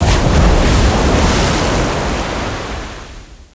water crash, debris; XDM

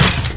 wood, debris; XDM

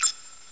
button rollover; hl1mp; XDM

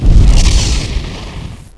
fire, weapons; hl1mp; XDM

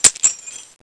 key pickup; XDM; arctic incident

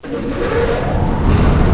fan on; XDM; fans

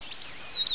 rat alert; XDM

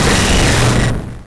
beam start, debris; XDM

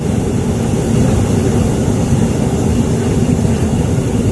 propane, ambience; XDM

